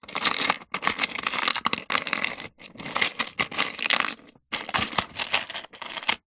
industrial tool box plastic compartment screws nails etc rummage